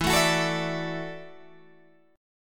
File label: E 9th Suspended 4th E down Guitar Open G